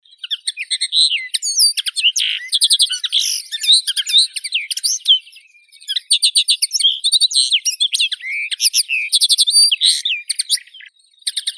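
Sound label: linnet, Carduelis cannabina; konoplyanka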